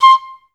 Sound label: STAC C5, Advanced Orchestra; Best Service; Peter Siedlaczek's Advanced Orchestra